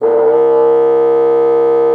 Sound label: MULTI, bassoon; Xsample VOL-6